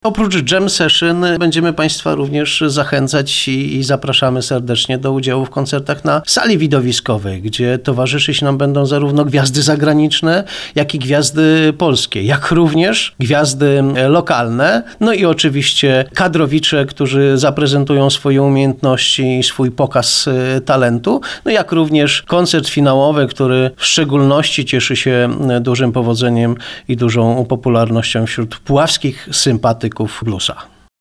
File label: Blues